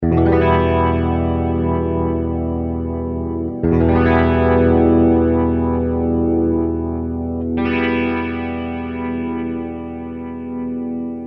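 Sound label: clean electric guitar, rotosphere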